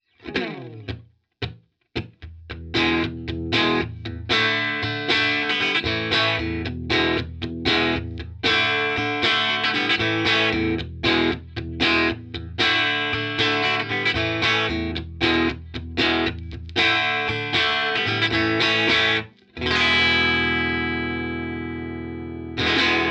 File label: tl clean rear